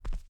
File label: Walk; Yoda; portal quest; android